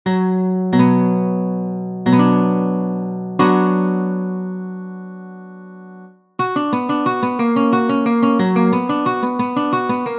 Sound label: Allegro, guitar